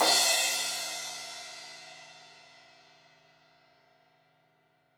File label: Crash 16in edge, Cymbals; Deeply Sampled Drums